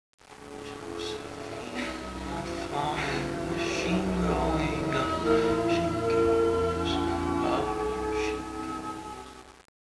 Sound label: song